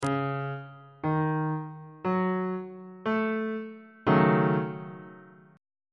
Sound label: d sharp half diminished 7th 3rd inversion on bass clef